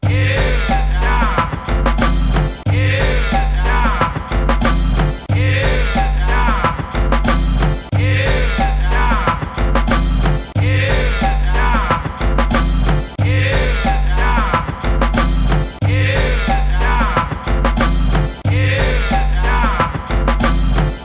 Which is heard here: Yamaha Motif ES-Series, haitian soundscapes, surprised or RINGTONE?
RINGTONE